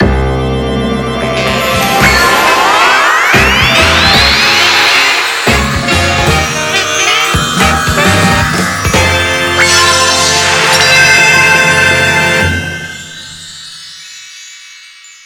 World Clear

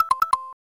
Pause, The "Pause" theme; SMW

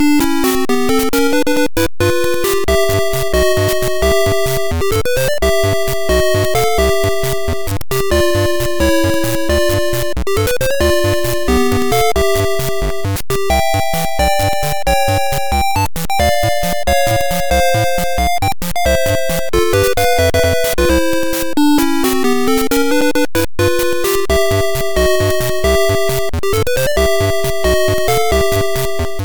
Underwater BGM Sharp X1

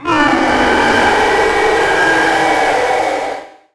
Giant Bowser Roar; NSMBW

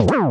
Boss Hit; SMW

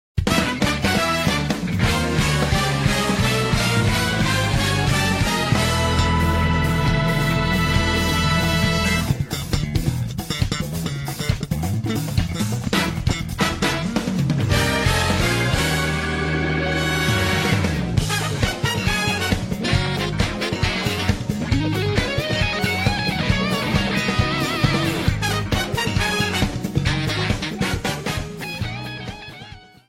Jazz Fusion; Mario Kart 8

